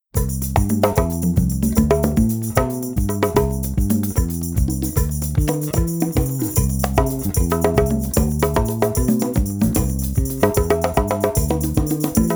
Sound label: congas afro; atelier robin natural drum kit kontakt; NDK